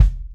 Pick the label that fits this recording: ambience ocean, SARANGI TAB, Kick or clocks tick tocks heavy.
Kick